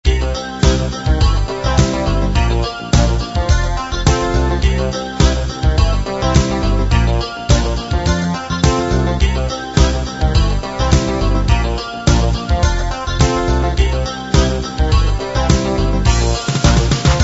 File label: clavinet funk; Works for: POP, ROCK, FUSION & CCM